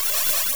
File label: rail; peter pan pinball